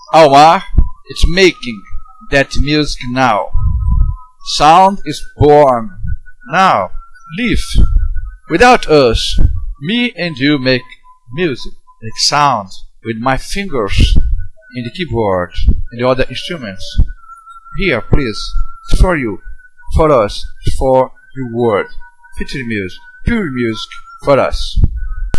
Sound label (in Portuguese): music born sem ruido